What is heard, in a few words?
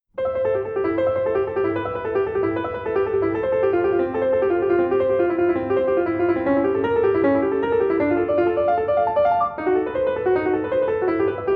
Etude, solo piano; uke welch; solo piano works from composers of colour